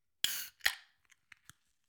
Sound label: Can open